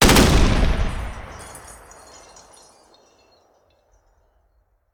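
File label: Artillery Cannon Anti Aircraft; Sound Ideas; Guns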